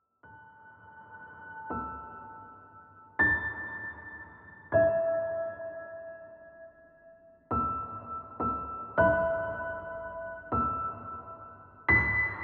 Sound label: Happy Birthday Sad Piano Version